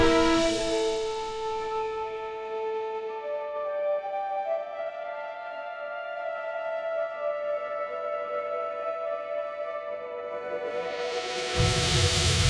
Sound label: ringtone cinematic